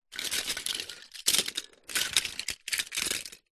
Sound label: leisure toy cars pile on carpet rummage movements; hotwheels